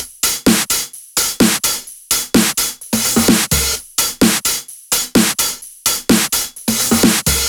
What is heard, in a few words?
128BPM Big beat Kit; Vengeance Samples